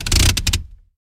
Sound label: Car Setting A Parking, parking brake